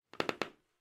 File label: Creak Floorboard; Phasmophobia